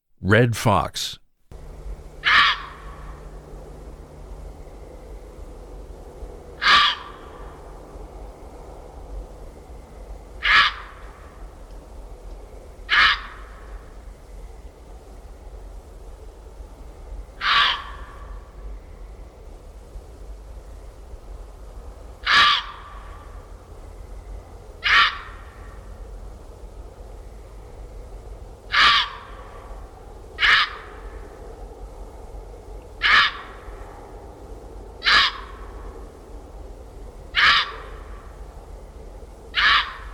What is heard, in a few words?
Fox bark